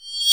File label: REV, SOUND EFFECT REVERSE